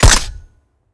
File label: fire, weapons mini14; supp; Urban-Coalition; Decay; arccw ud